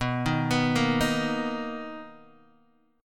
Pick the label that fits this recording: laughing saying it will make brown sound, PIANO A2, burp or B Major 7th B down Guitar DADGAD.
B Major 7th B down Guitar DADGAD